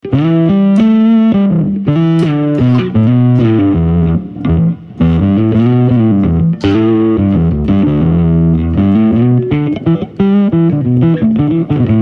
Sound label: Bass Drive Solo